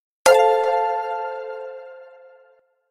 positive win game sound

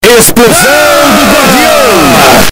explosao intesifies

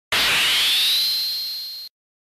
limit break; final fantasy viii sound effects

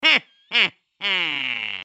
evil laugh

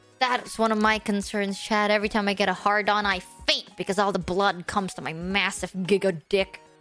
faints

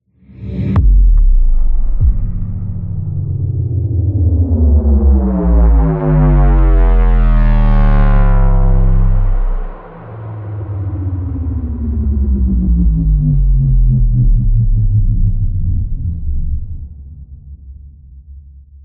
time skip sound effect

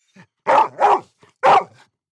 animal dog bark

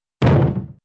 wooden bodyfall

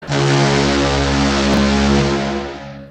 fog horn

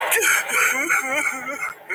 crying

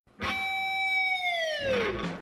guitar riff; eric andre

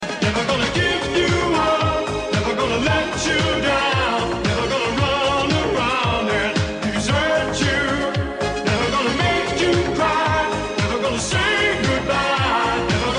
fapping sound

